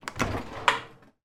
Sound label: household dishwasher door open